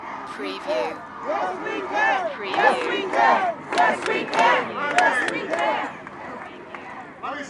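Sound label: Crowd Yes We Can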